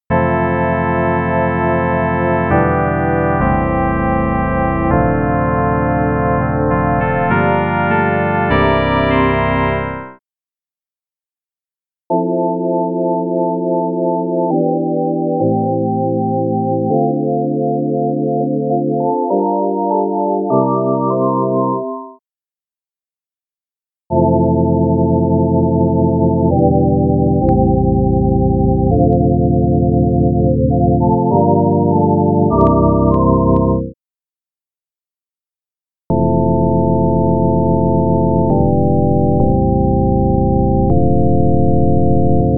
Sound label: organ